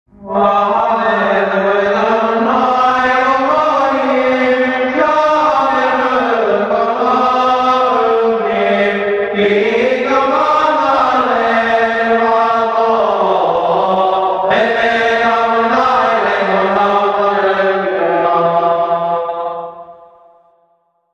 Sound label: Introductory Music